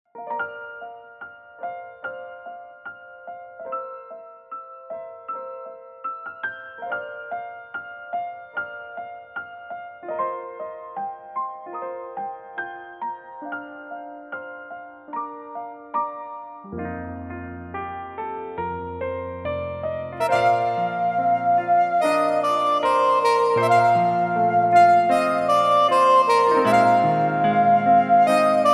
play reference sax soprano

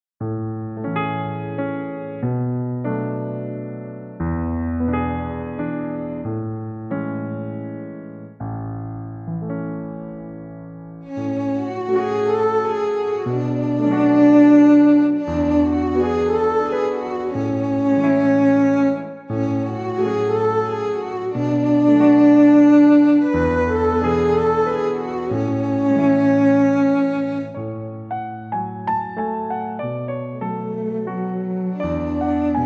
play reference cello

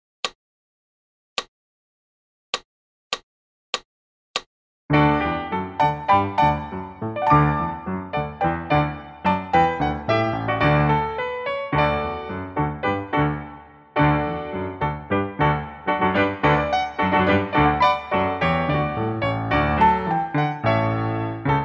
piano, D

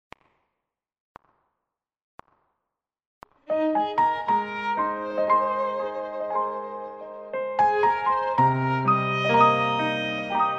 piano play

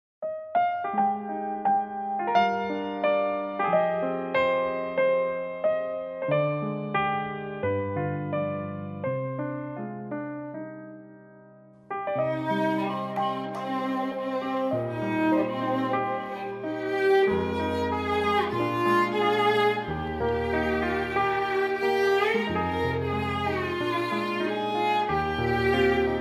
piano violin

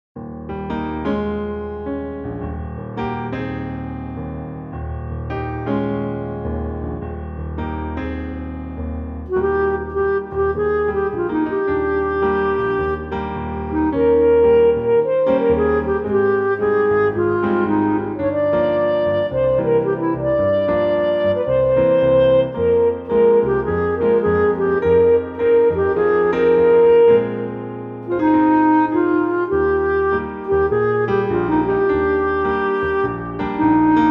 Eb, clarinet

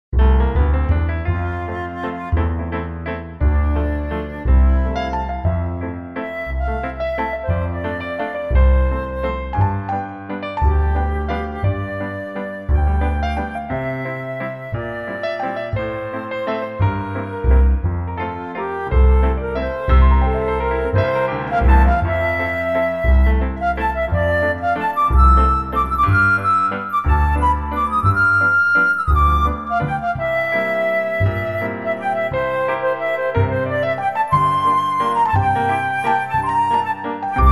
play reference flute

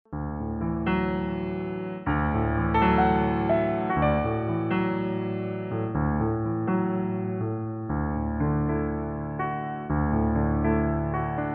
piano

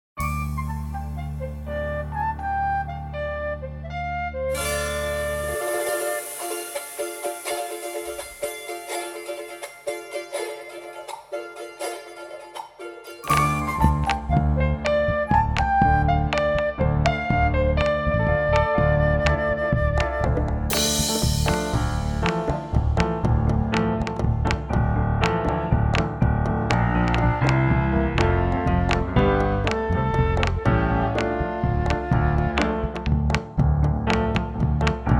Dm

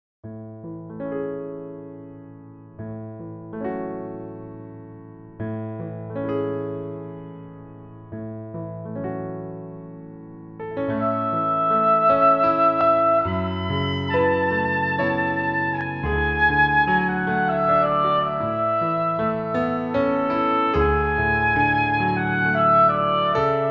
piano cover oboe reference

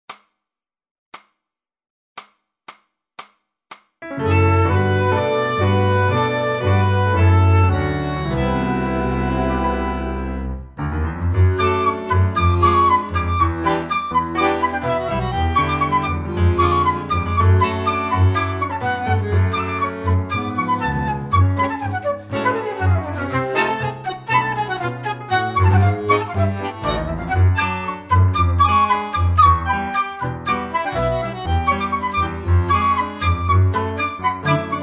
trio flauta real

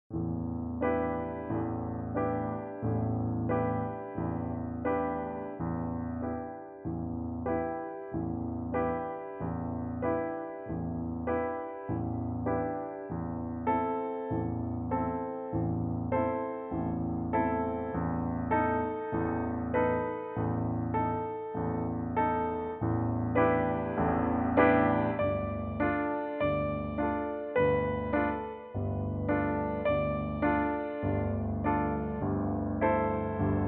piano cover

